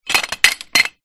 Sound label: metal large pole loose parts movement ground; foley